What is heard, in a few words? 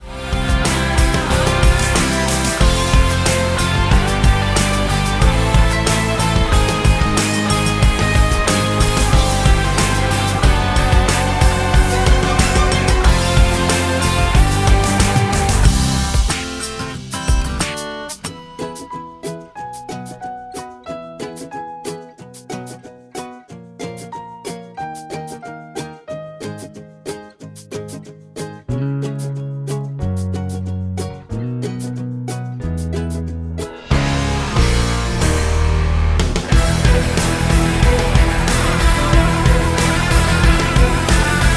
Accompaniment